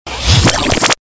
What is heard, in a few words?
Galery Noise; Sound Ideas - Galery Noise 001